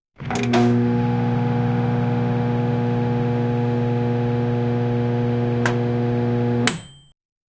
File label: microwave ding when food is ready; cooking